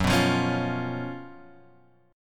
Gb Major 7th Suspended 4th, down, Guitar, Open G

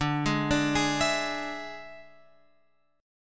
D Suspended 2nd Flat 5th D, chord

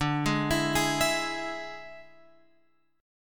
D Suspended 2nd Flat 5th D, Guitar, Open F; x,9,8,11,11,11; arpeggiated

